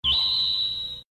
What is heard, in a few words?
anime shine